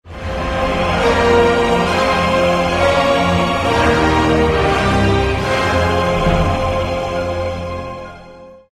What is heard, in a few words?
ringtone